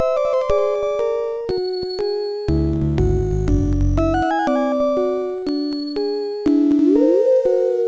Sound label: jingle; Traps n Treasures